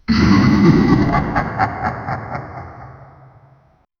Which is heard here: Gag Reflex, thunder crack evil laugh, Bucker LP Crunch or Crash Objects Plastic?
thunder crack evil laugh